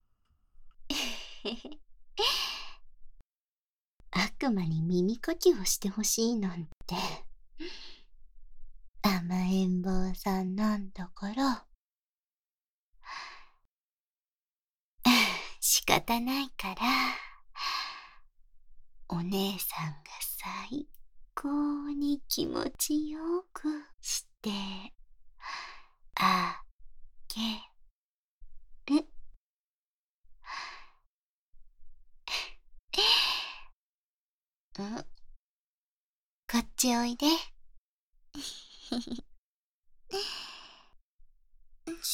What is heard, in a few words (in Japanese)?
耳かき, バイノーラルマイク 効果音大きめ; 悪魔の耳かき; 効果音あり